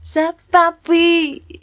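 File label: female uh, 129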